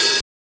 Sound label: Perc